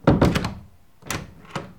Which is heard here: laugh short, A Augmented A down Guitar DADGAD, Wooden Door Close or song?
Wooden Door Close